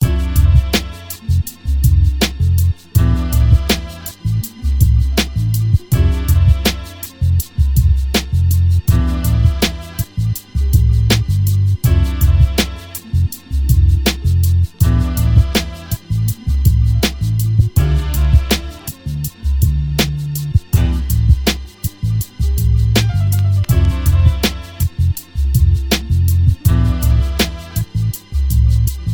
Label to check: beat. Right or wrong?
Right